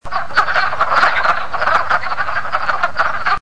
wood frog

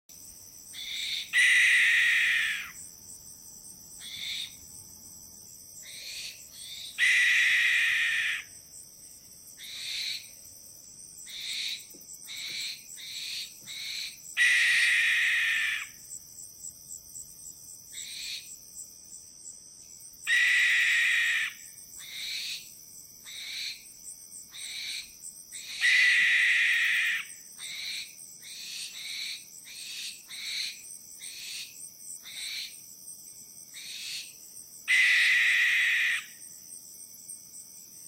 Barn Owl Shrieks Snores

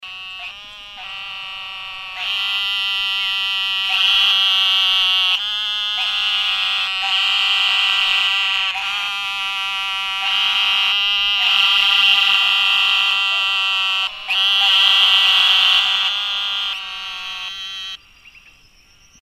Eastern Narrow-mouthed Frog; Wildlife

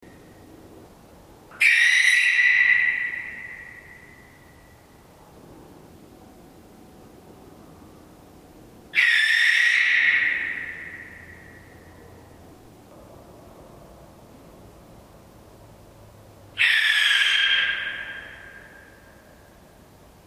shrieks, owl

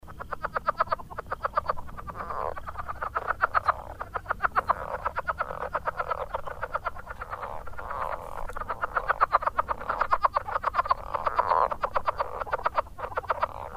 Southern Leopard Frog; Wildlife

